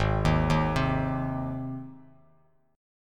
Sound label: Ab Major Ab down Bass Standard; arpeggiated; JGuitar